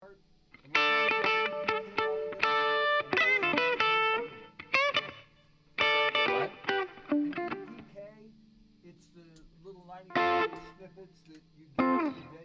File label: Strings Only